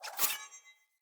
Sword Accent Blade; Weapons; Swords; Garren Sword

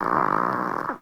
Kitten Snore; Warframe; Catbrow

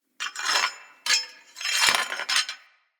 Smithy Metal Misc; Ambience; Soul Plane

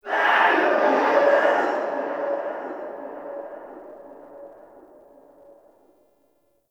Stadium Infested Far; Warframe; Ambience; Nineteen Ninety Nine

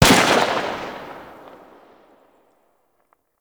Pistol Tail, Weapons; Warframe; Grineer; Kohm

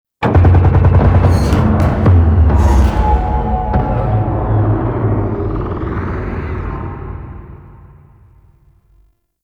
Contact Hull Impact; Gameplay; Narmer; Warframe; The New War

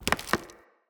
Mockery Foley Melee Swing; Mockeries; Characters

